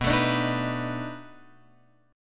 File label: B 7th Sharp 9th, down, Guitar, Open F; strummed